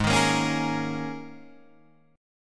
G sharp Suspended 2nd Suspended 4th, G sharp, down, Guitar, Drop D